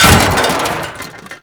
Fan Blade Destroy; Warframe

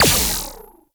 Laser Skin Printer Laser Start; Warframe; Ambience; Entrati

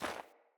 Sandals Run Snow, Footsteps; Player

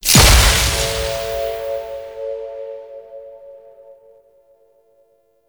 Protective Shell Cast; Warframe; Citrine

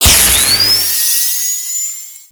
Mine Activate; Warframe; Veilbreaker; Sprag

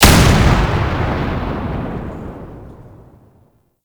Tank Cannon Shot; Enemies; Weapons; Warframe; Lasrian; LasrianTank

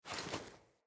Foley Action Default Unarmed Parry; Player

